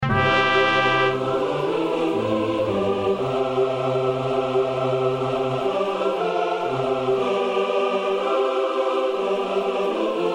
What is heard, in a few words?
Synth